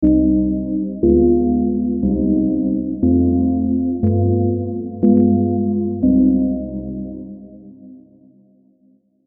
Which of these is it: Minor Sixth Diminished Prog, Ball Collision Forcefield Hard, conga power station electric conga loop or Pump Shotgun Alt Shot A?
Minor Sixth Diminished Prog